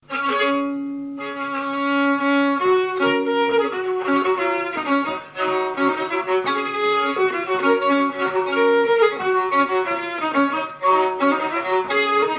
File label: scottish double, violon